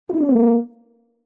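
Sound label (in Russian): короткий акцент нелепого финала туба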